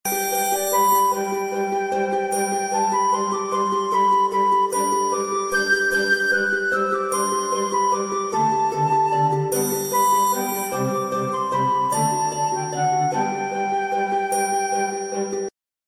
elegant iphone tone ringtone

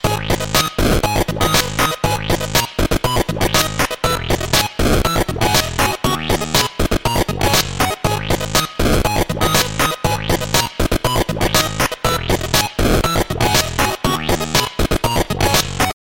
instrumental track ringtone

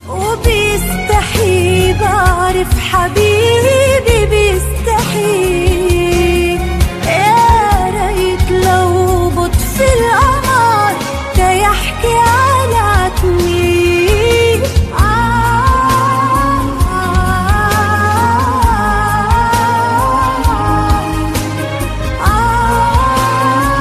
arabic ringtone

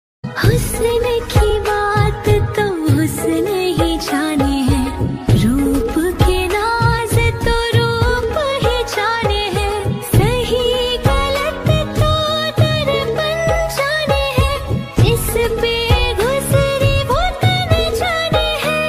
Hindi sad song ringtone, Hindi Punjabi

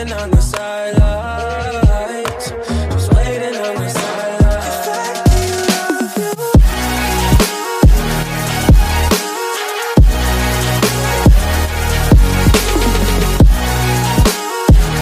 remix latest english ringtone

